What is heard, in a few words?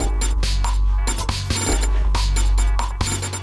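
Saver Loop, beats; Sonic Foundry (Sony Creative Software); Esoterik Beatz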